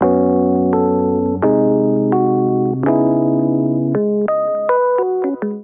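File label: Little Amp Electric Piano Chords C# Minor 85 bpm; MIDI Clips; Accompaniment; Ableton; Chop and Swing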